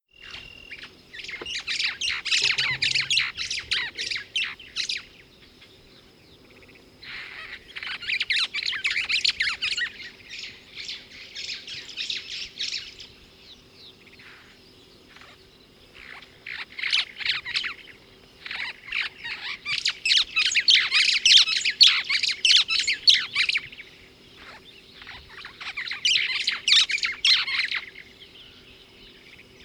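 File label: Plocepasser mahali -- White-browed Sparrow-Weaver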